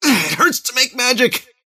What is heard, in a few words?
cast pain; dota; kez